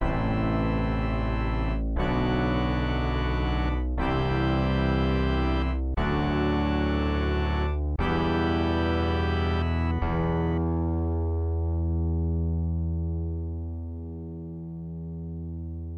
Fanfare; starshot; nintendo 64; N64; Virtua Circus Fanfare

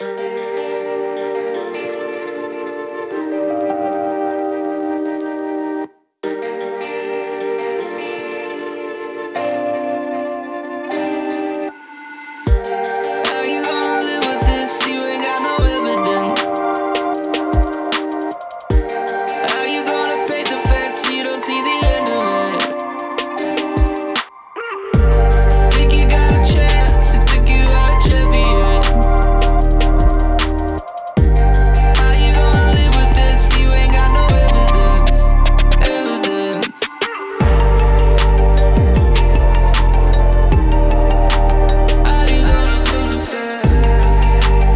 HIPHOP; paint the fence; test drive unlimited solar crown; xbox series xs

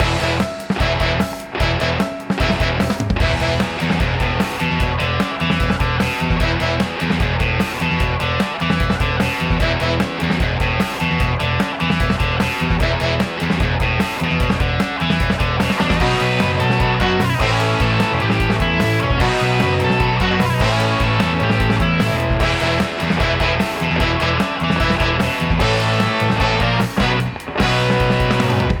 BGM POSITIVE; beyblade x xone

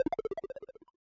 Boss Core Hurt Stinger; Avie

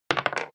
Dice; Google Snake

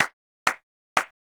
Clap Hands

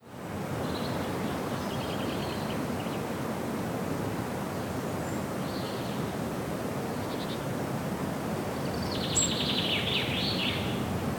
Molokai Forest birds

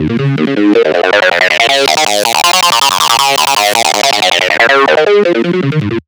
Fill Acid 160 D#; exciplex sample presets pack; JinoBeats

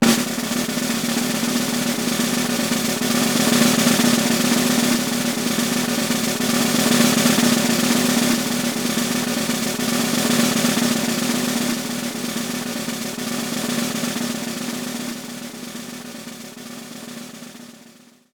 goal drumroll; gamerip; PS3

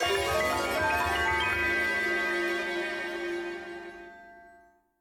Sting Compass Part Collect

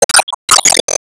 Normal Shot; disney star smash android ios mobile gamerip 2020

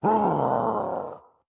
Leap; Caffiend Caelan

